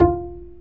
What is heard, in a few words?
BASS F5; ORCH.; ORCH.COMP